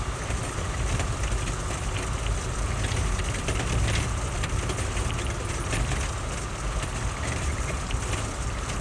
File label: dirt dry, Tires; Kart; AOS RF1 Kart Demo